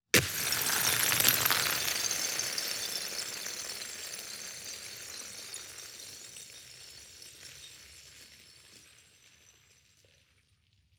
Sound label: EXT Ice Drop Shatter Slide Series